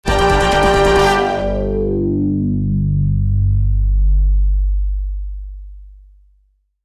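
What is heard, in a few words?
Music Logo Dance Groove, orchestral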